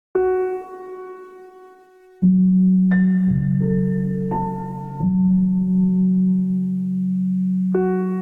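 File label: Discordant Piano F# Major 130 bpm; MIDI Clips; Tonal; Atmospheric; Ableton; Factory Packs; Mood Reel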